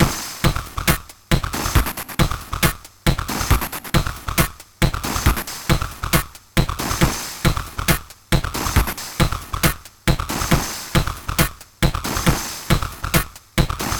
Beat using individual pitched sounds 1, Tr 505 bend